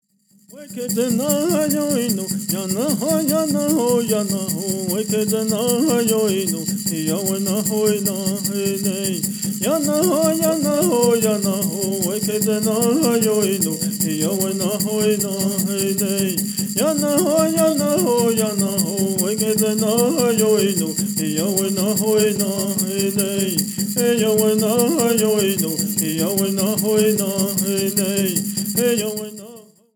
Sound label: Vocal Track